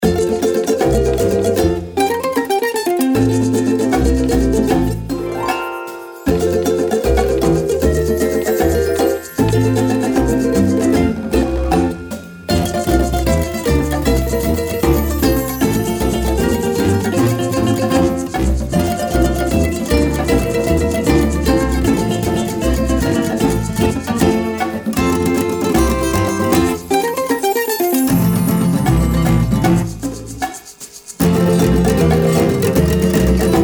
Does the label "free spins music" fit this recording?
Yes